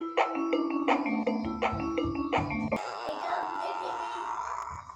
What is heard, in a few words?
burp; chukpa